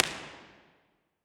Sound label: fig8 impulse response original; NCEM